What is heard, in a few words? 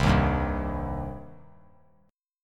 C Minor C down; strummed; JGuitar